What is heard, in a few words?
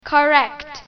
correct; English Animal Identification